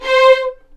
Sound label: C5 VLNS ARCO DB; East West; The Ultimate String Collection